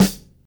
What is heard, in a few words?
subby snare one shot g key, steel snare drum sample tuned to the G note; snare drum samples